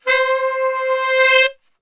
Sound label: C 2 SAX, Brass Super Section; Best Service